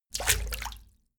walk foot in

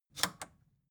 record player power click on slow

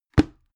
bucket put down hard

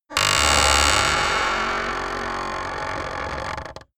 wood gate open creak heavy

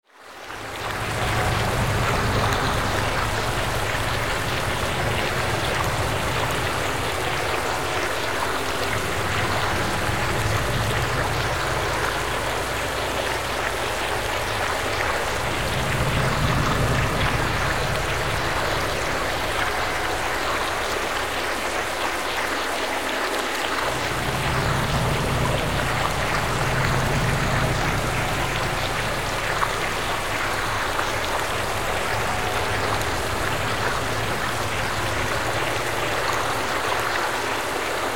bizzare waterfall

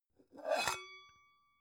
pan open soft

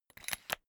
umbrella telescopic retract

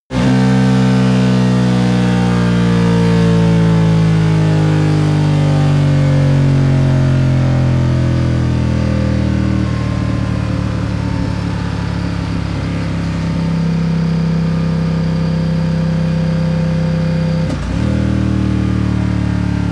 motorcycle ducati cruiser deceleration long

